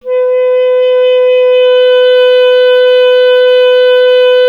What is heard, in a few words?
Clarinet B; Miroslav Vitous; Solo Instruments VOL-1